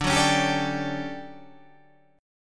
D Major 11th D down

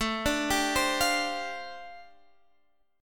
G 7th Suspended 2nd Suspended 4th A chord

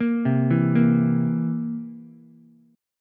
Bb Suspended 2nd Flat 5th Bb down Ukulele; arpeggiated

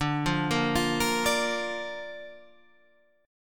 D Minor Sharp 5th D down Guitar Standard; Dm#5 arpeggiated; JGuitar

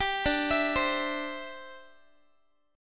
D 7th Suspended 2nd Suspended 4th D, Guitar; D7sus2sus4 arpeggiated

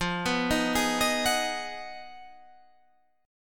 B 6th F, guitar chord; B6/F; arpeggiated

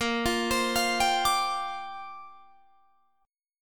A sharp 6th Add 9th, Guitar Drop D

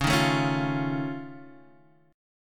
F Augmented 7th C sharp, chord

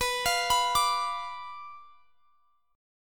B Diminished B down, guitar; arpeggiated

